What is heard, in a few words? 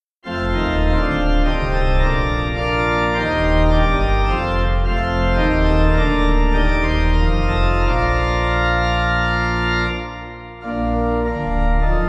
Pipe